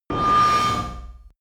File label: shuttle bridge, raumschiff